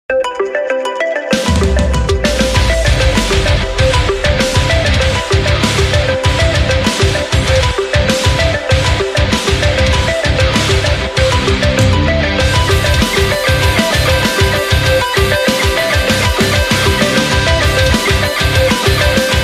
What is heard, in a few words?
iOS 7 metal remix